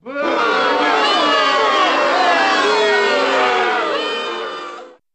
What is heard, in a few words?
Medium Sized, Mixed Crowd Yells 'boo'.; reactions